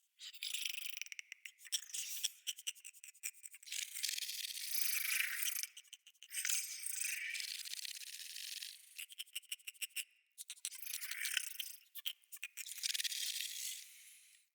Corrupted Critter Loop, Ambience

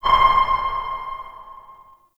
interior orbital station battle map ping; Warframe; Tau

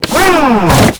Clan Dojo Elevator Door Close; Doors

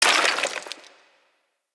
Melee Heavy Air Slam Impact Dirt; Whooshes; Jump Slam

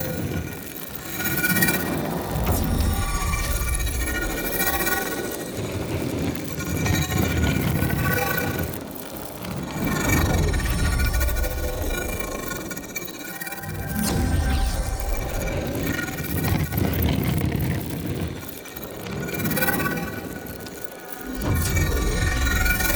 Labs Grimoire Pedestal Loop; Warframe; Ambience; Entrati

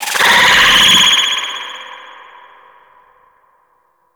Stomp Warning Vocal; Enemies; Vocals; Riot Moa; Warframe

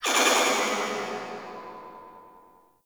Distant Metal Ronks; Warframe; Corpus; Railjack

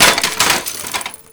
Bicycle Hit; Ambience; Props; Warframe; Nineteen Ninety Nine

